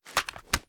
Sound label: reload drum hit; viper